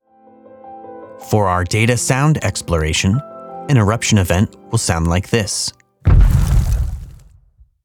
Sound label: Axial LT Update eruption event